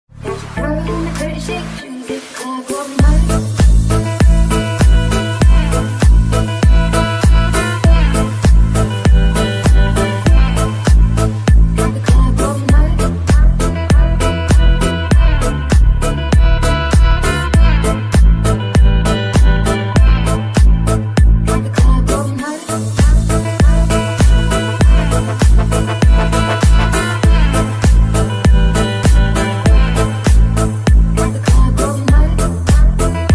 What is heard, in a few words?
tuesday ringtone instrumental ringtone